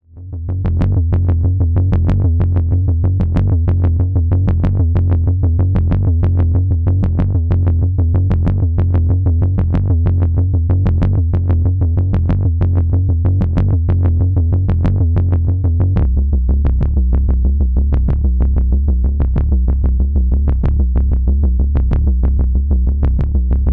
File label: Thump; Sound Particles SkyDust 3D